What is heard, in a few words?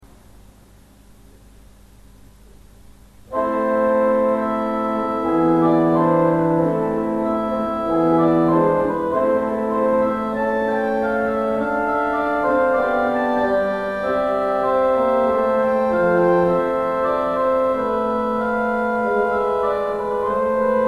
Salicion 8